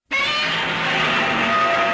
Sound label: scream